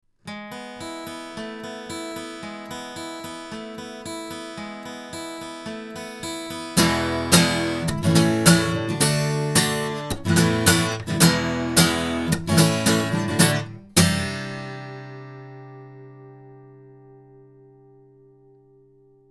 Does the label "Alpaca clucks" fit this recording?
No